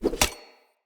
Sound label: Throwing Knives Blade Flip Accent; Weapons; Player; Swords